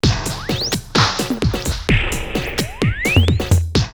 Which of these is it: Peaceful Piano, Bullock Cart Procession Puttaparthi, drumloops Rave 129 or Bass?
drumloops Rave 129